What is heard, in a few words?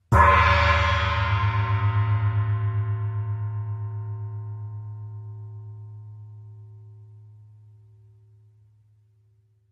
Cymbol 6 Inch Splash Hit